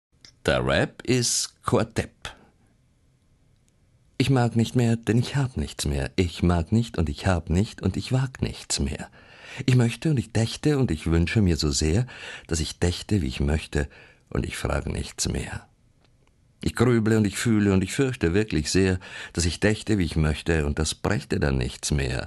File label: rap